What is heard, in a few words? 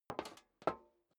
step high heels metal dry scuff; schritte; Footsteps